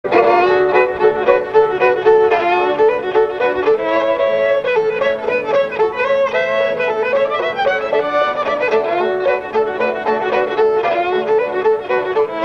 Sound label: Ragtime Annie